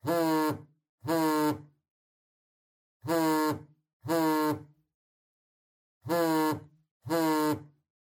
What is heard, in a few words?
Cell Phone Vibration (Table)